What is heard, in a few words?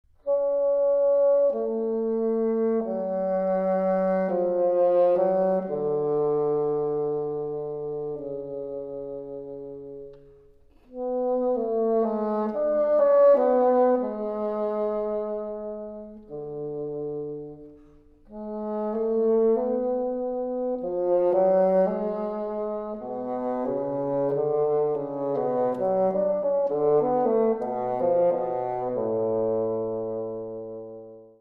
sonata bassoon